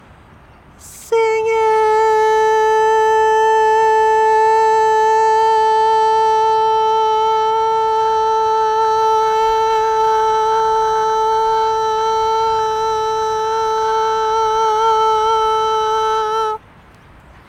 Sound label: Singing Refreshes my Soul, Tenor, Barbershop